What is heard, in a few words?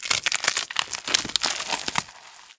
plant Open; Kethotum